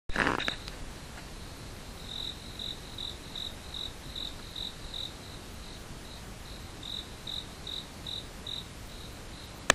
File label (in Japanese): musinokoe